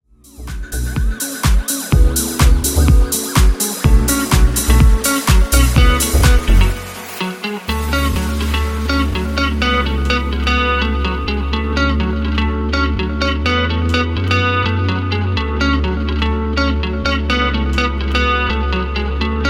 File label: House 125bpm